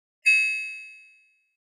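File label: HF Electronic Spring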